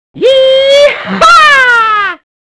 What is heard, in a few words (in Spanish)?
nivel up; deathrun dg